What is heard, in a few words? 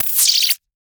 a sharp futuristic zap, error